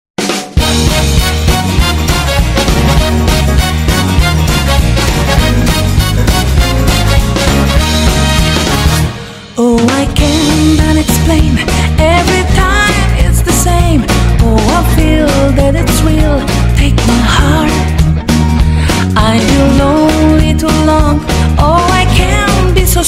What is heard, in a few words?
quickstep music jazz dance orchestra